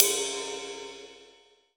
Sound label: RIDE, MONO RIDE CYMBAL; AKAI S6000 CD-ROM - Volume 3